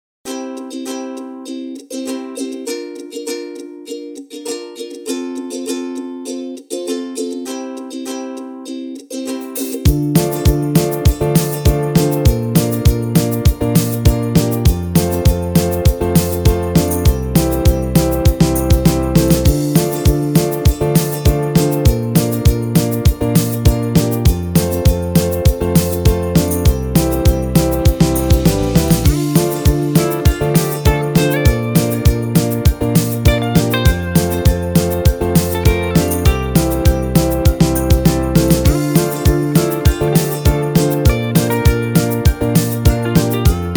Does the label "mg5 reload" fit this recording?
No